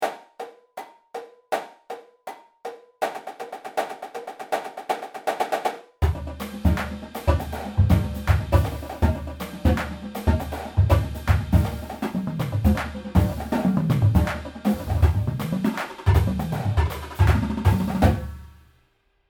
Slurred Tap-Five Modulation

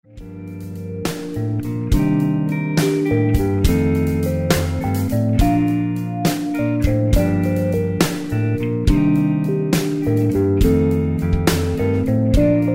Slow Dance at Mixer

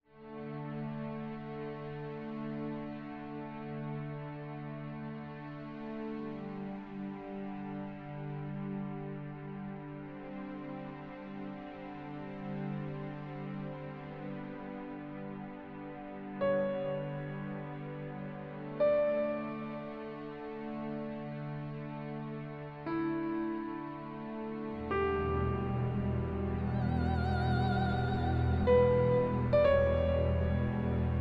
music only

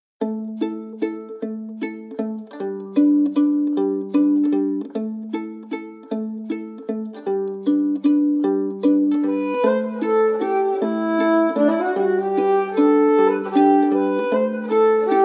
Bidh Eoin Waltz

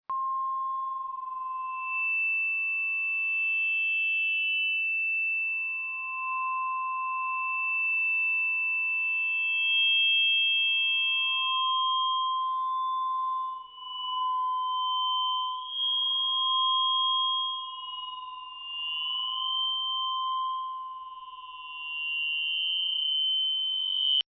feedback sample

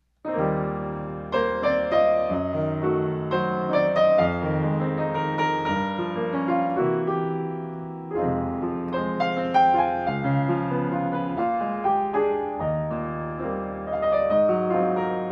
solo piano

